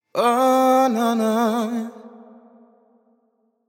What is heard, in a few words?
Euphoria Ad Lib Wet - 95 BPM A Min; Cymatics; Vocal Sample Pack; Ad Libs